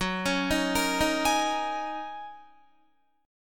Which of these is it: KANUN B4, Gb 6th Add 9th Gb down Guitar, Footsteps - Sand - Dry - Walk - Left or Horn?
Gb 6th Add 9th Gb down Guitar